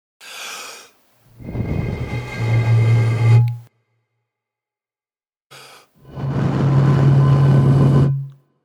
Person blowing air into a glass wine bottle creating a musical note.; Bottles; Sound Effects:Musical